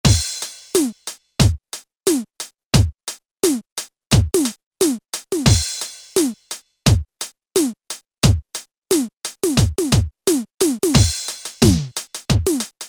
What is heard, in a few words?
E-Drum Kit, Alesis Z2 EuroDance